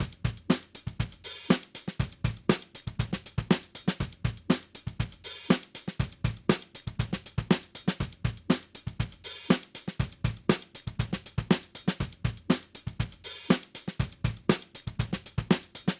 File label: Drums